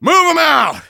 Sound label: battle cry